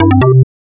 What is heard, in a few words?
alarm; pesterchum; trollian2.5